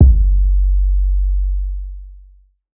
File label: 808's